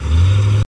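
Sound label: vehicle start loop taxi; The Sims 3; Windows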